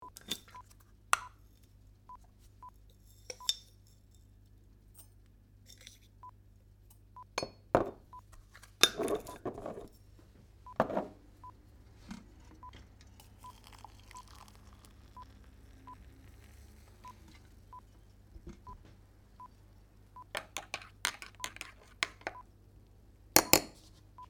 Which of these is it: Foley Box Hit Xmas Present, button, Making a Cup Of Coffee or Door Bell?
Making a Cup Of Coffee